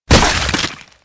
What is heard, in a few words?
body crush; Physics